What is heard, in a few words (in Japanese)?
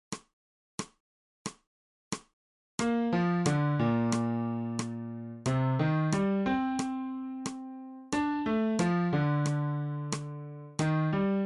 新フレットレス